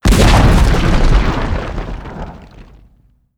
tower destruction; building; damage

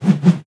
idle spin; troll

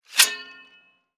draw katana; weapons; dota; kez

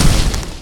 impact; weapons; dota; lich

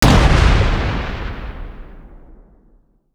remote mine; techies

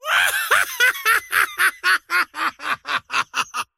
wheel laugh; dota; largo

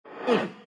pain; dota; death prophet

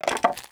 bucket foley; tusk

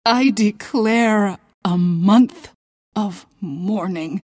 pain death; queenofpain

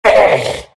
big pain; Dota 2; Undying

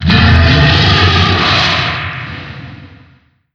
scream; dota; creep; roshan

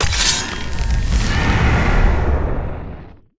chemical rage cast; weapons; dota; alchemist

